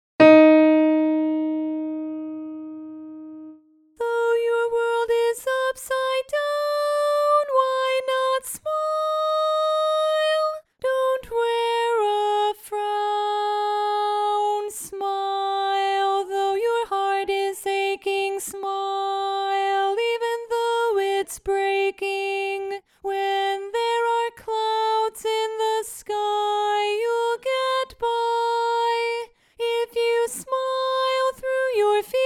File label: Tenor Alone